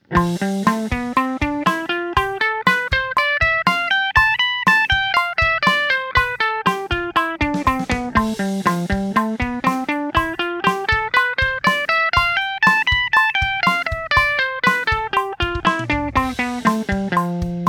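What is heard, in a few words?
Am Lydian oct bpm120 eighth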